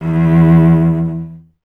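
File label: STR VC E 2, strings cello; Miroslav Vitous; Solo Instruments VOL-1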